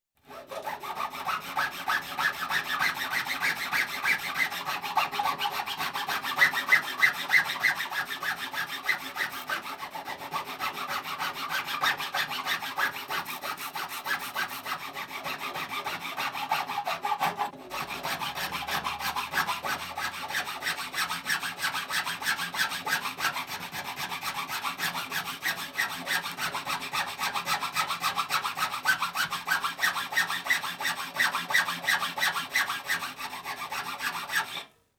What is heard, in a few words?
File, Wood; Construction; BBC Sound Effects Library